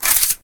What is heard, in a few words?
metal